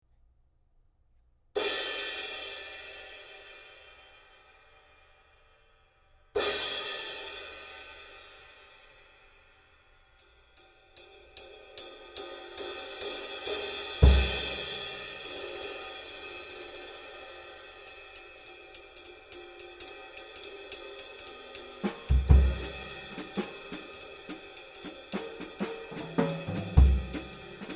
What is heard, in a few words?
Ride Crash; 18 Isty K 1410 gr